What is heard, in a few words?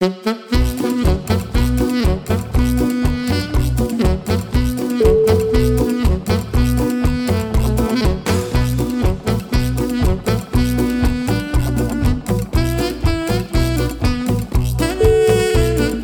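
crazy cumbia, music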